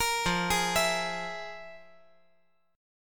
F Minor Major 11th, F

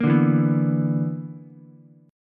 B-Minor Major 7th Double Flat 5th B down Ukulele; BmM7bb5 strummed; JGuitar

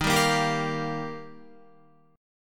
Eb Major Add 9th, Guitar; Ebadd9 strummed; JGuitar

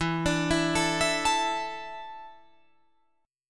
E 7th Suspended 4th, Guitar, Drop D

